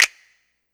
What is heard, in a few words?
clap